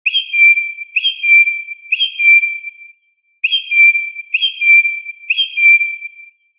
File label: birds